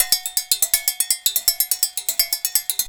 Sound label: bulu grooves; Best Service; World Colours